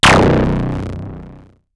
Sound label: effects, drum machines, FutureRetro FR777